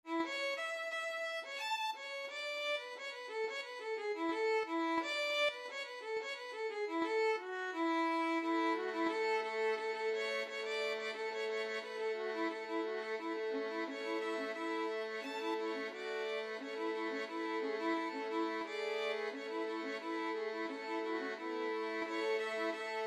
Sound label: violin trio; cotton eyed joe